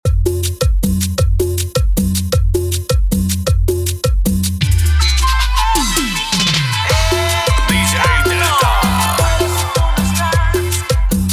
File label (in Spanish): Cumbia